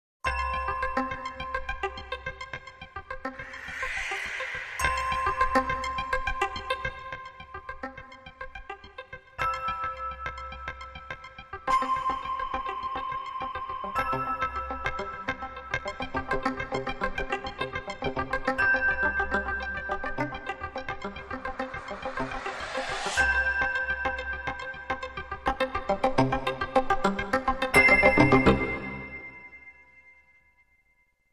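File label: Pulsing Light & Airy With Bells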